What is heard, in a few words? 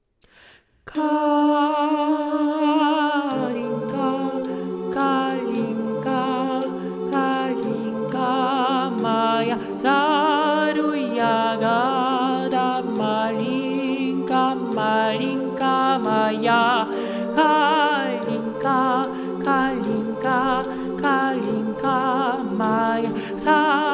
kalinka tenors